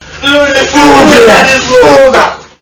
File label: fart; pvkii